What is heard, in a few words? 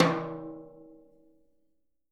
Living Drums, JZ XTOM 1